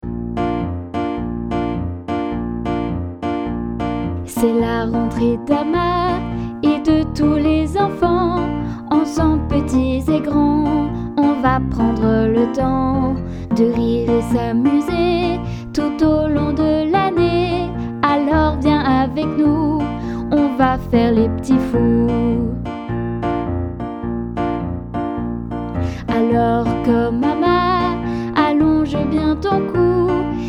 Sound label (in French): chanson